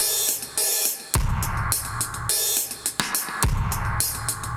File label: Beat D 105, 105bpm; musicradar; dub designer; Beats